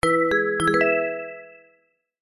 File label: multimedia alert musical warm finish complete; zapsplat